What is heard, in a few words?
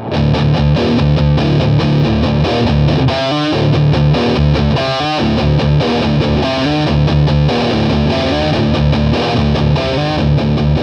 Pedal